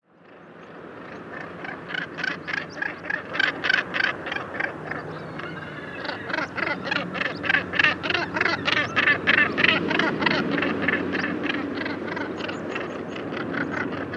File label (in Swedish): Havssula